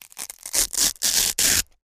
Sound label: Velcro is pulled apart at various speeds. Tear, Velcro Rip, Velcro